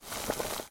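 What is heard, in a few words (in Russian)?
шуршащий резкий шум воды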